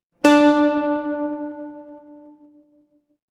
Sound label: transition braam